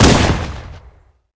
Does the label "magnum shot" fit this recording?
Yes